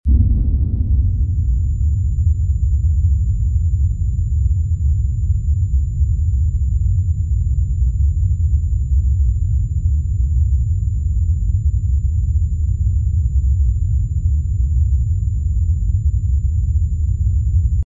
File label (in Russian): kontuziya shum